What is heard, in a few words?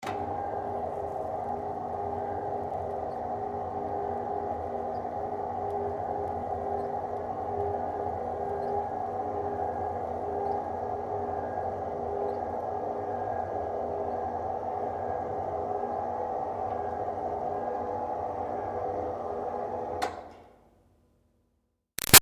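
Projector Screen Medium Far Up Long; Offices; Big Room Sound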